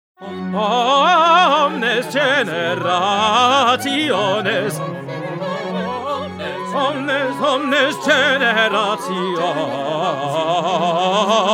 Tenor; Bach; Magnificat

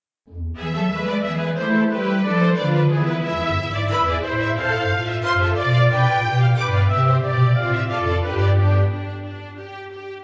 Instrumental

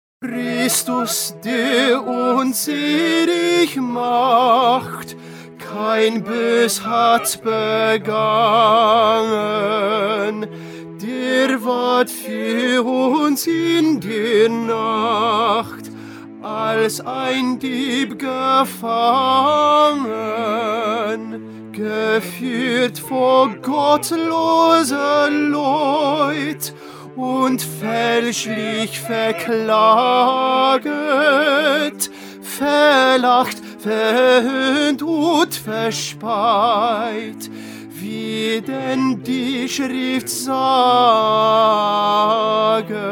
Tenor; Bach; St John Passion

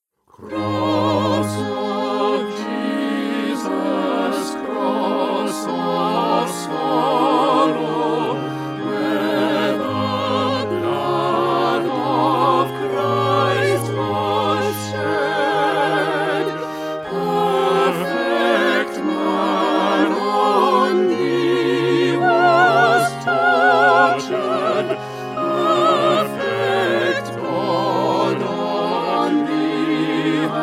Concert Version

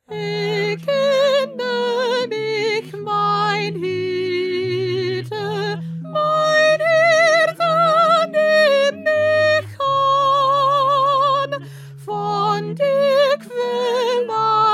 Soprano

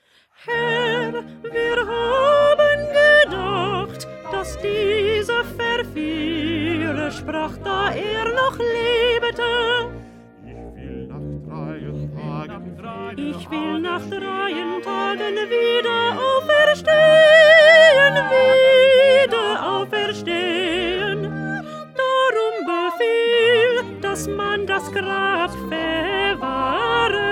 1st Alto

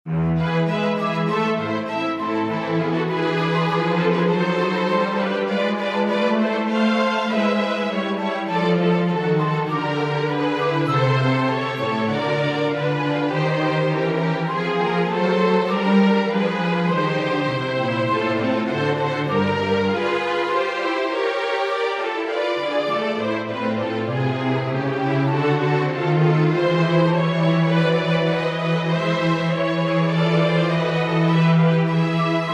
Instrumental; Bach; St John Passion

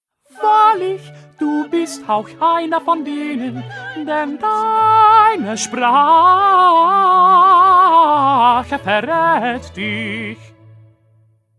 Tenor; Bach; St Matthew Passion

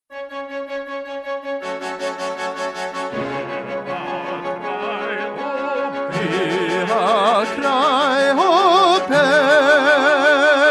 Tenor; Mendelssohn; Elijah

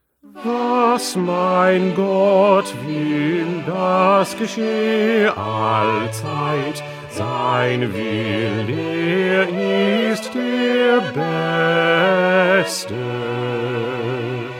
Bass; Bach; St Matthew Passion

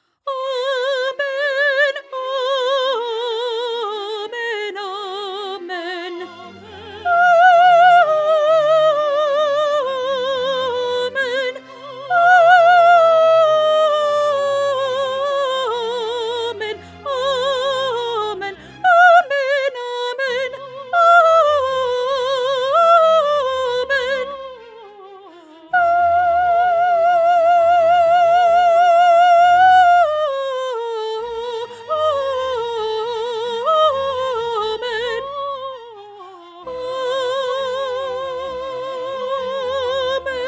Soprano; Pergolesi; Stabat Mater

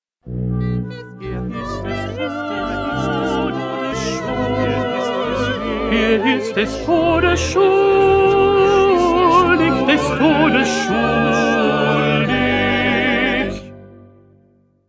2nd Tenor

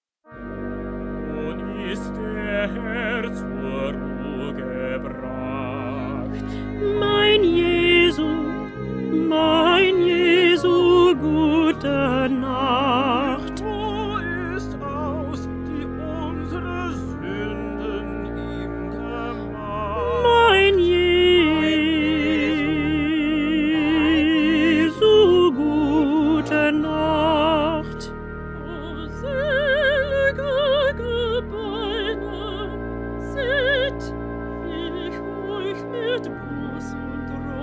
Alto; Bach; St Matthew Passion

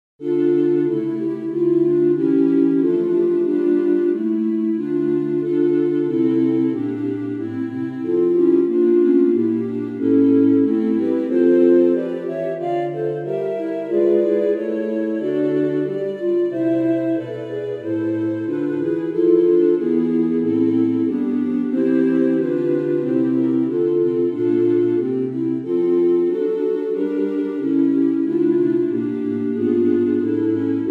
Instrumental; Bach; St John Passion

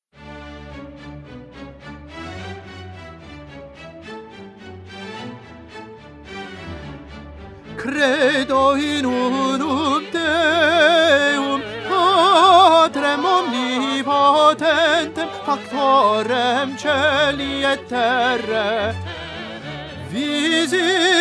Tenor; Haydn; Nelson Mass

